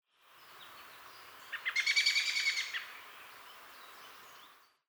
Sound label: american robin call note; paul marvin